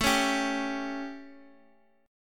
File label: A 7th Guitar Open F, chord; strummed; JGuitar